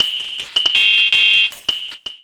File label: Morph Bumpers, Fx; Sonic Foundry (Sony Creative Software); Esoterik Beatz